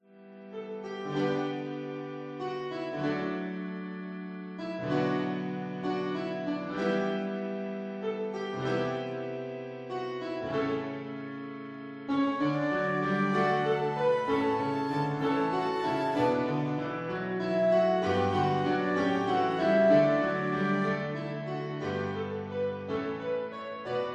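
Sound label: accompaniment; The Lord is the Good Shepherd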